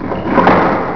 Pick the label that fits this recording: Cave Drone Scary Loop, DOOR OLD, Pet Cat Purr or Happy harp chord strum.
DOOR OLD